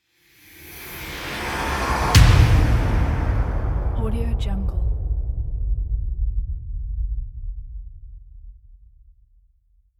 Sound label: big cinematic drum hit